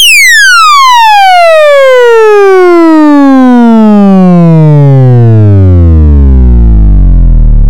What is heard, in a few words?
Cartoon Space Ship